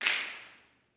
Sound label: SNAPS, Gospel